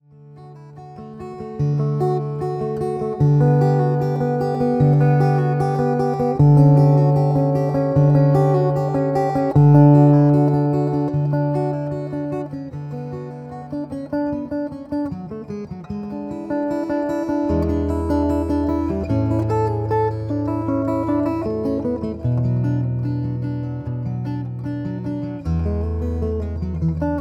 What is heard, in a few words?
bach cello